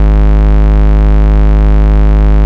Bass, Root G#; Vengeance Samples

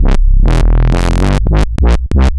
Roland A Line, Roland S100; musicradar-synth-samples

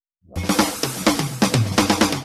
Fill 128 BPM; Vengeance Samples; VEH1 Loops

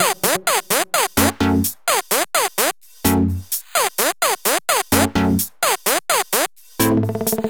Melo 128BPM; Mini Kits

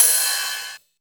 Ride, Cymbals; Vengeance Samples

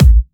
Kicks; Vengeance Samples

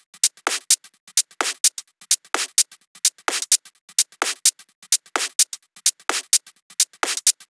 128BPM Drums; Vengeance Samples; Control Kit

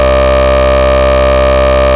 Ultimate Bass Root C; Vengeance Samples

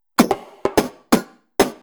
Electro Loop 128BPM; Vengeance Samples

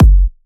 Kick, 128BPM; Vengeance Samples; Emote Kit

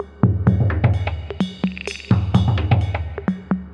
House loops 128BPM; Vengeance Samples

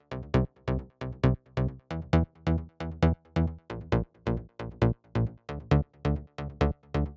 134BPM Bassline WET, Cmajor; Vengeance Samples; Lovely Day Kit

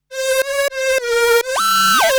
Roland D Line, Roland S100

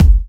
Soft Kicks; Vengeance Samples

